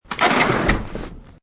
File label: Slide Door Close, Sliding door closes.; Doors